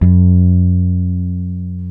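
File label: bass guitar, soul electric bass; Roland L-CD701; E.Bass 4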